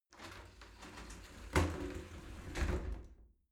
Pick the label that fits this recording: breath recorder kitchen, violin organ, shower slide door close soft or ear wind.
shower slide door close soft